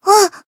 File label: damaged jp; nagi